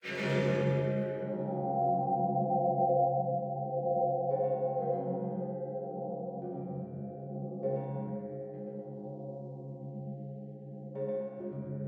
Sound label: Sliced Boys Choir; Among the Sleep